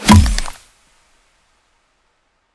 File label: Shoot